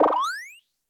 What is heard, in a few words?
used jump; hex-a-hop